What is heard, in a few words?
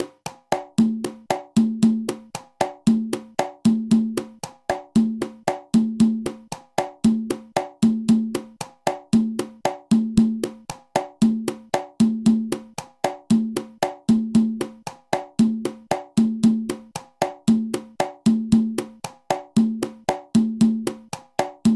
cha cha cha congas